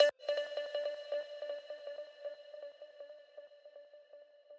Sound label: arp